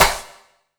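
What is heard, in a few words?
snare drum, Drum & Bass, 168 BPM; Ueberschall; Drum & Bass Resonance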